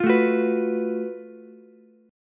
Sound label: Gb Minor Major 11th Gb down Ukulele C6; GbmM11 strummed; JGuitar